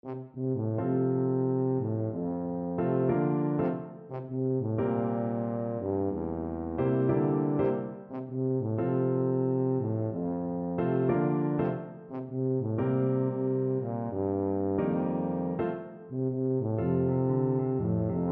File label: whole world, tuba